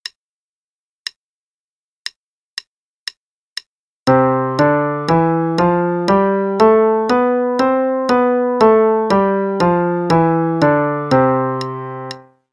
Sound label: C Major Scale (qn=120); Level 2B - 2nd Ed; Play-a-long; Faber